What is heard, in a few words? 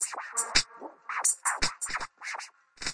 highpass skidders, drum loops and breaks, 163 bpm; 161 to 180 bpm